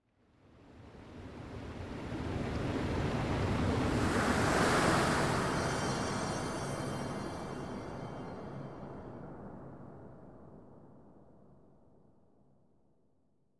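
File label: sounds of magic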